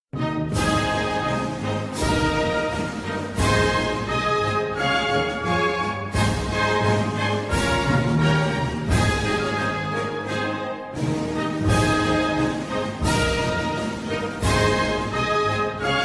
anthem

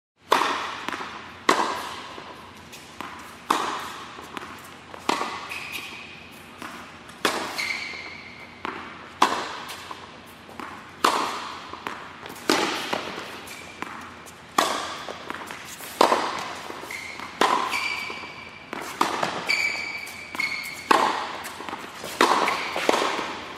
tennis

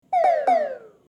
disco toms

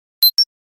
nfc success, nothing phone

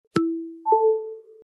ios18 magsafe charging; Системные звуки и уведомления iOS 18 (iPhone 16, 16pro, iPad)

